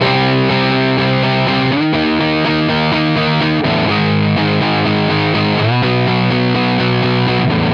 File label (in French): sennheiser sature; m-audio sputnik